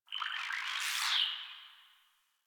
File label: Tree Critters Happy Calm, Ambience; Props; Warframe; Duviri